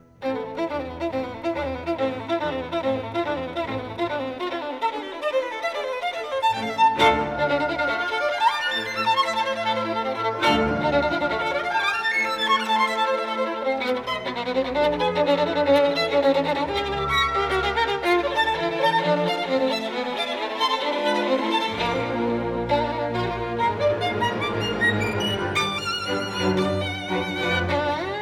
Violin Concerto No. 5 in A Minor, Allegro con fuoco